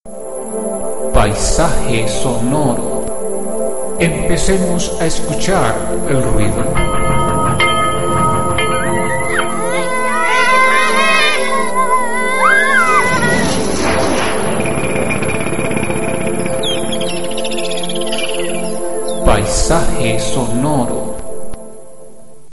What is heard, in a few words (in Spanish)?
Paisaje sonoro